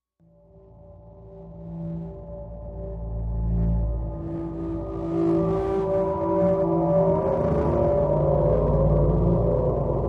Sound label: Machine, Distant Space, Alien Call, Space Drone, Atmosphere; Tensile Strength